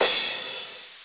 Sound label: crash; Aplicaciones dos